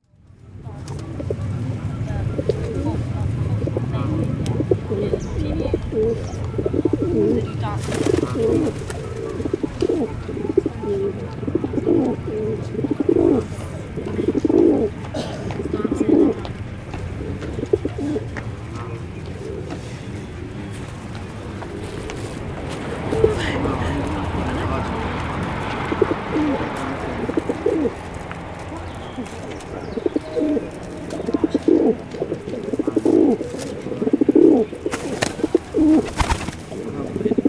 pigeons cooing teenagers impersonating birds social gathering under a railway bridge